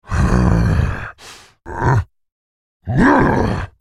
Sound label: Maokai laugh; character voices english